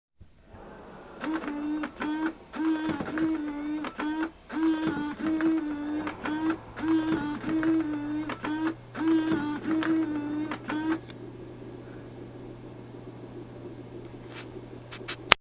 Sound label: noise discharge